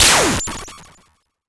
Cwejman S1 Rise Zap

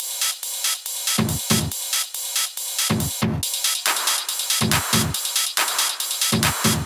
drum Loop 140BPM Full

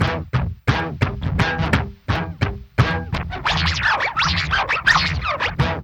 BASS, Loops; East West; Funky Ass Loops

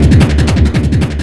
LOOP LFO, Big Beat; Big Beat Samples & More; AKAI; ALL LOOP KIT

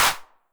HAND CLAP, 909 Filter

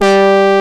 ANALOG COMBO; OMI - Universe of Sounds

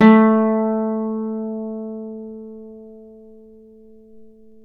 Celtic Harp, Plucked Strings

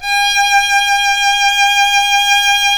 Synclavier Strings; ILIO

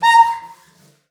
CELLO FINGER; 90 s Sample CDs; Xsample VOL-5

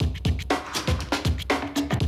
120, Classic Drum Loops

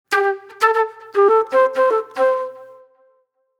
Disco Flute

